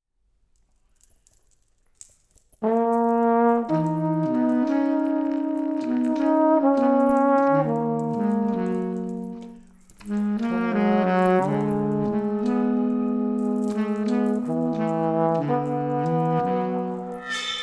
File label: BRASS BAND